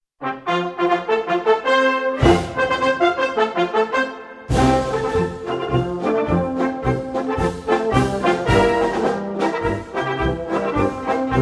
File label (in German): Marsch